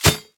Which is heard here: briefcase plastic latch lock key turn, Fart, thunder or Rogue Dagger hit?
Rogue Dagger hit